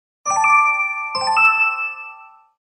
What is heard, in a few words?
Opening tone; Samsung SGH-i450v